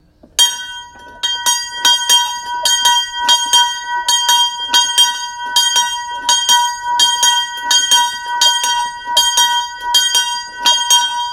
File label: the bell ringtone